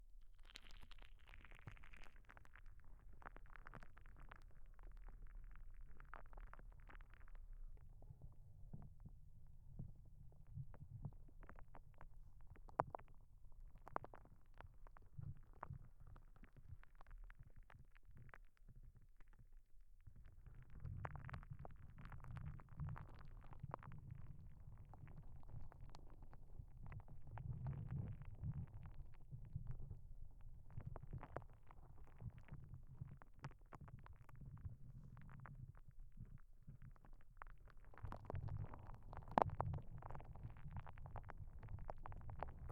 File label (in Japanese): ジェルボール, 右耳